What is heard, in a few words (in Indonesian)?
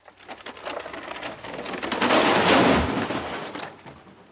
suara mobil